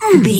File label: voice male hurt pain